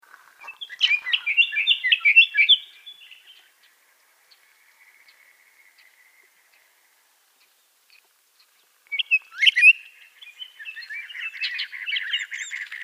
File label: Scotts Oriole Icterus parisorum; birds-that-eat-grape-jelly